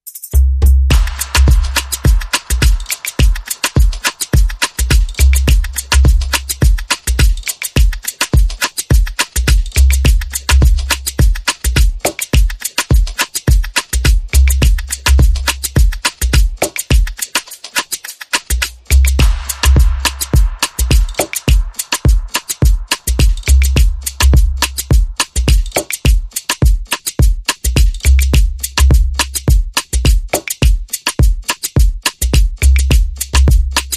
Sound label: Beat